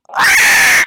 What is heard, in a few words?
squaaaaaaaaaaaaaaaa